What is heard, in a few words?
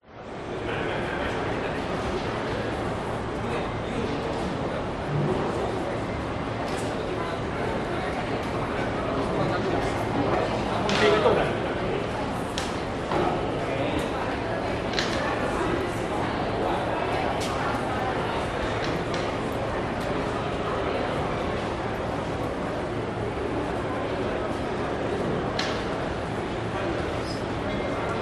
public places train station entrance people walk talk busy street; wynyard; sydney cbd